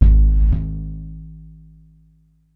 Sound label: Gated Kick, Gated Reverb; Univerb; musicradar